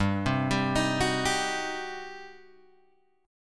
G Major 7th Suspended 4th Sharp 5th, G, down, Guitar, Open G